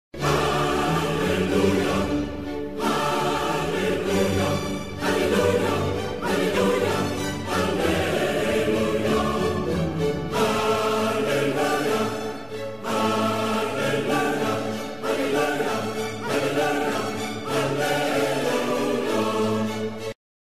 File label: ringtone